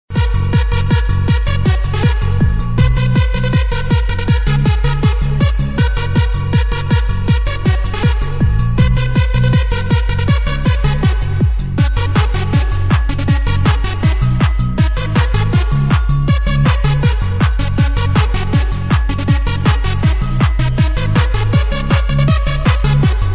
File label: jingle bells techno